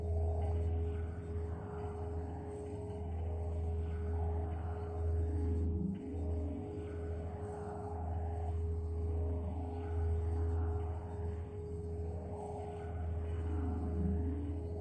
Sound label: success hum